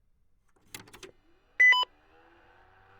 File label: nec pc9801uv11